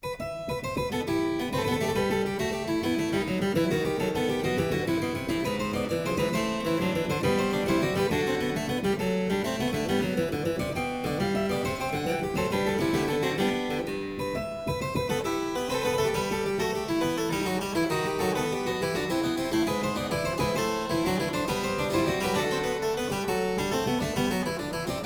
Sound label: Suite in E Minor - Gigue, Harpsichord; Suite No. 4; HWV 429; Handel; Suites for Harpsichord